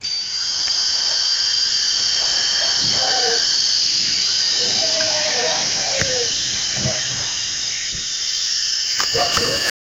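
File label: the tired murmur of people, fountain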